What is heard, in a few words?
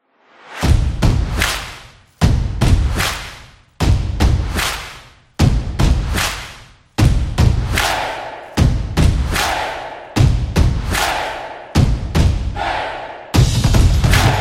Sports Stomps; drums trailer